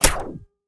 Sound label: quickStop; netent; copycats